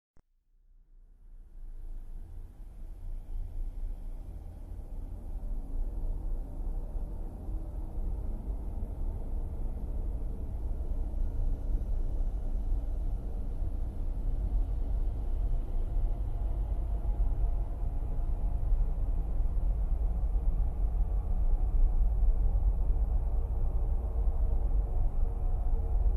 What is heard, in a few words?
Ambience (Living Room); The Joy of Creation Collection